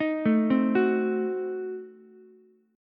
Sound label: F sharp Diminished 7th, Ukulele; arpeggiated; 8,9,8,9